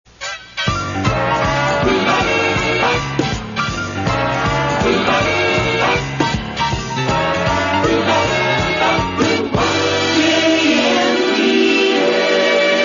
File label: Jingles; KMPX; 1982